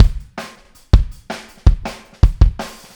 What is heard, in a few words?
Kick, 162 BPM; Verse; Drums